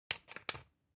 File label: usb port metallic in hard